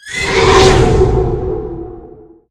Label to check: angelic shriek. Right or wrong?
Right